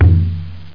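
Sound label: conga, loop, percussion; Sound Library 2000; Wayzata Technology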